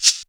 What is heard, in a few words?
maracas, Yamaha TG-500